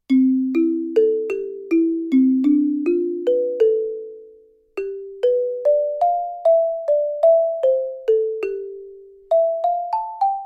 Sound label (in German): AXG 1000 mit einem Schlägel; studio-49